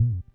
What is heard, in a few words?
Drum, ct-24; classic machines